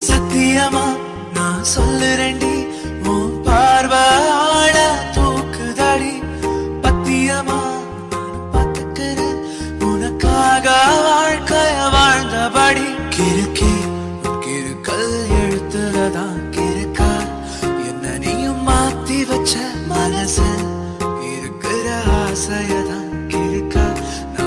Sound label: tamil song